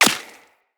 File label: Bullet Impact Flesh; Weapons; Bullet Impacts